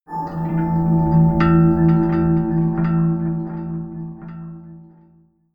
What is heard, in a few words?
melodic odd pad bell low